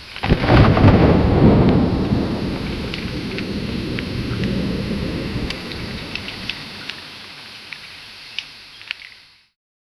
a fog drenched jungle; ape

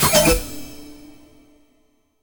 a spell is cast but, failure

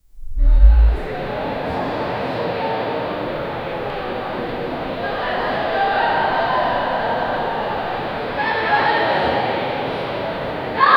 soft murmurs and whisperi, crowd

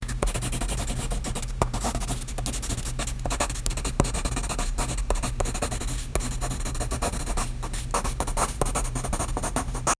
pencil writing; stationary

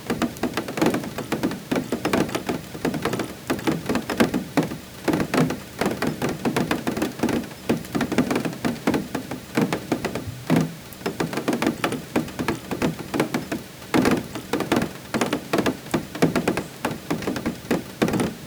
gentle rain falling outsi; atmosphere

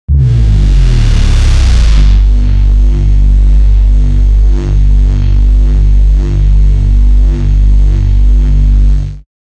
aggressive synth; bugatti

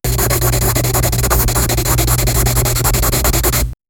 pencil writing; education

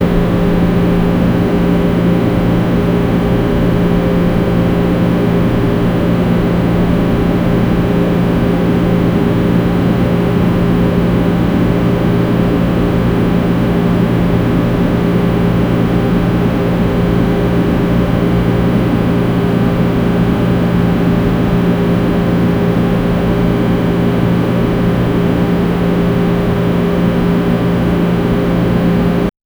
the sound of the air, air conditioner

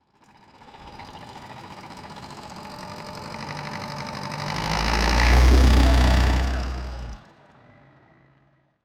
v8 boat start sound; sound effect

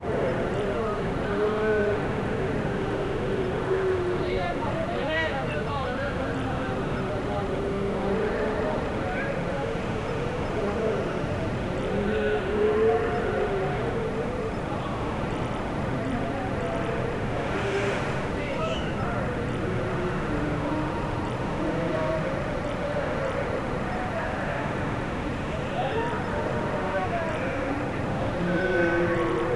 medieval ambience of a ra, city

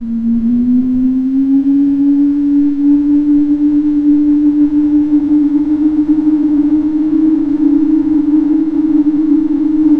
a hyperrealistic steam engine

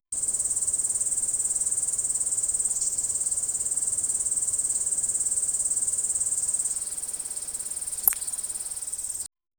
insects

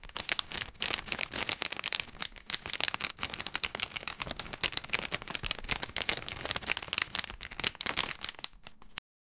the sound of twenty paper, adhesive

